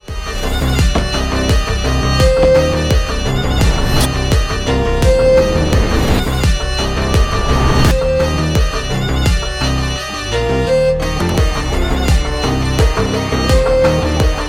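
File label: background music Ringtone; Vikram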